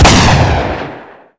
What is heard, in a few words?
fire